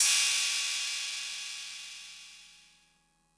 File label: cymbal; MFB-522